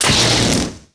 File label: explo droid, impacts explos